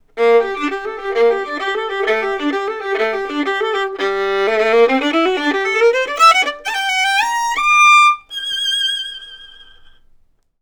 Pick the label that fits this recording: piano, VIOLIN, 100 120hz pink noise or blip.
VIOLIN